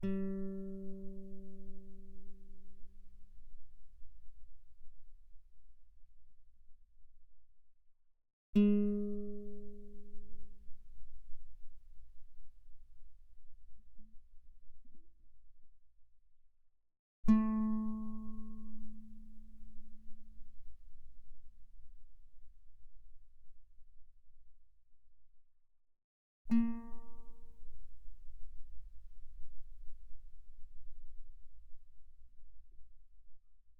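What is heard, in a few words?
Guitar pianissimo sul G G3 B3; mono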